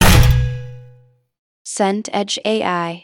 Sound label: door metal heavy close